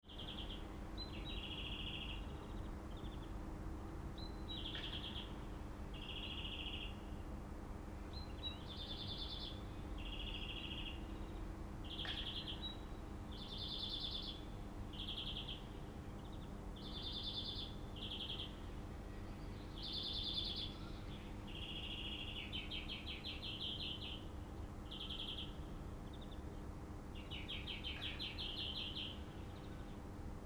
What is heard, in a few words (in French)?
cimetière, Pont-de-Claix; Depuis l'ancien cimetière de Pont-de-Claix; Enregistrement : Laïs Janvion